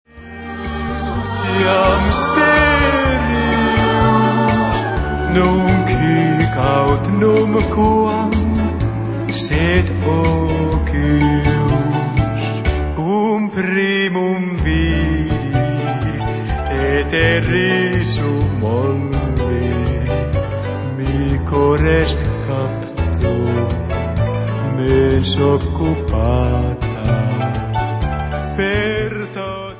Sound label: Songs Sung in Latin